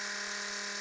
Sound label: mixer; Paintshop